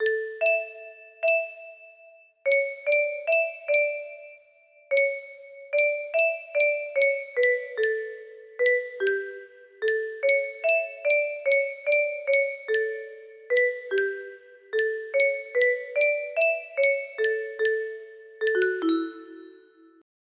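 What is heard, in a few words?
music box melody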